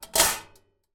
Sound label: household bbq grill place in bbq